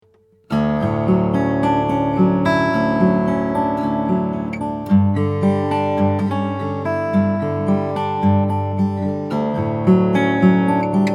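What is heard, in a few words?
2007 beauregard omc african blackwood, guitar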